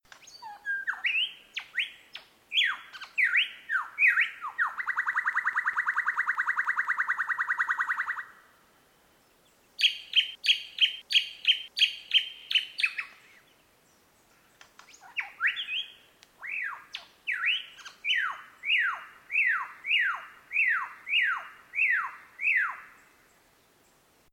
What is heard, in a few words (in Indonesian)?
suara lyrebird